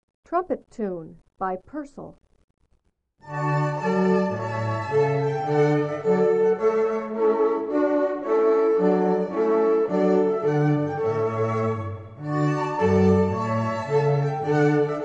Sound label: Trumpet Tune; Purcell